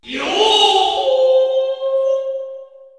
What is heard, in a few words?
yooo